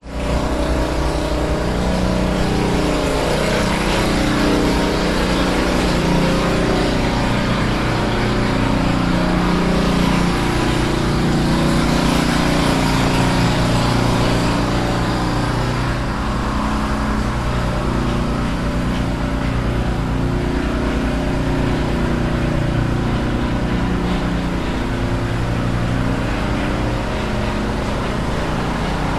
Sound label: household lawnmower operating outsdide open windows internal